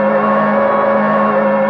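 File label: gearbox down; CrossRacingChampionship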